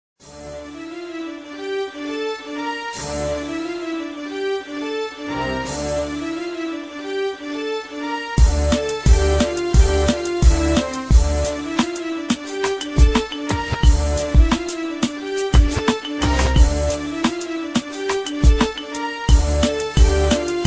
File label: hiphop instrumentals rap beats instrumental beats instrumental rap hip hop beats